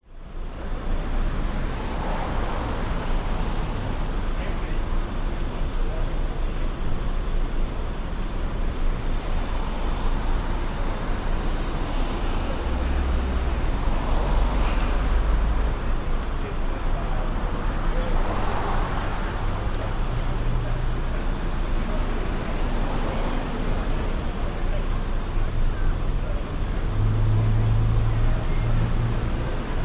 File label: public places city busy traffic airy pedestrians midday brisbane australia